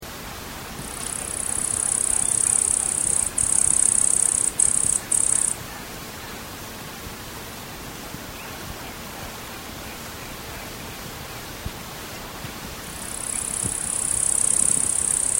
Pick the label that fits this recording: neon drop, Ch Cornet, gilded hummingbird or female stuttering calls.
gilded hummingbird